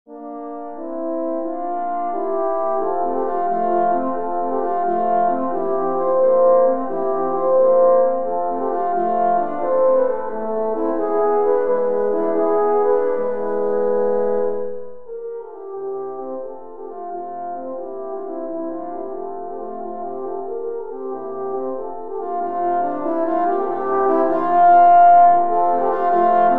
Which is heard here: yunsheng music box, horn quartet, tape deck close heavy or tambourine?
horn quartet